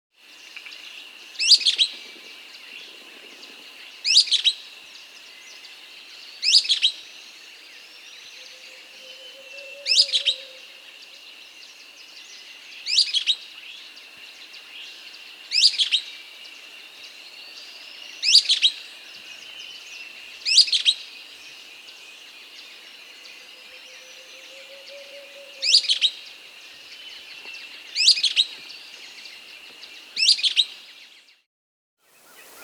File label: Golden-Crowned Flycatcher (Myiodynastes Chrysocephalus), Andean Birds; S. America